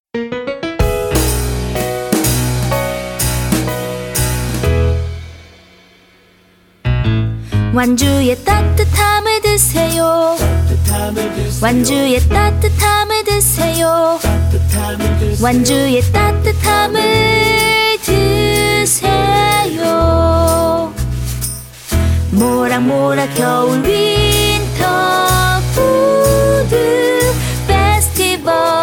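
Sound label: Korean, Commercial Song; GoLocalise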